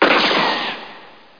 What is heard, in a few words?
Gunshot; The Incredible Demo; Tim™ Audio